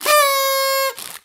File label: TADA